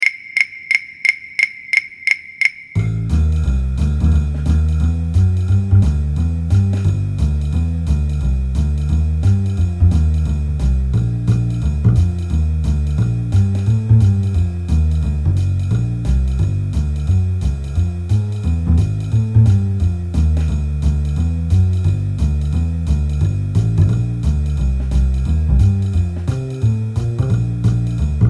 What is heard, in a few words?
no piano, hs jazz